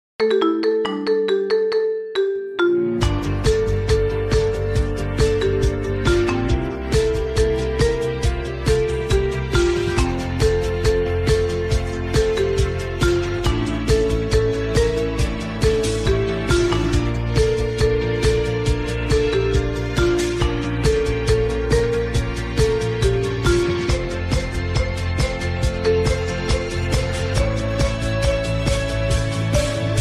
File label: Vampire Marimba